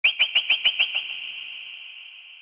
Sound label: electric bird